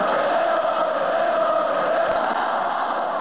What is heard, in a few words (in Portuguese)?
grito torcida